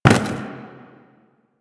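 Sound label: Cardboard Box Impact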